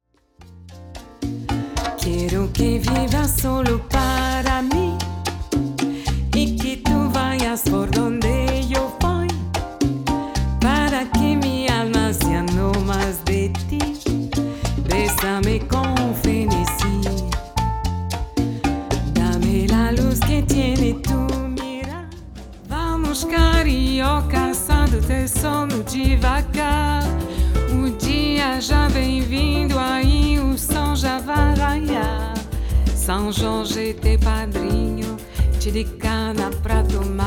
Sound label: Medley latino cocktail